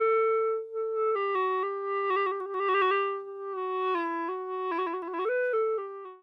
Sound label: flute sample; bawu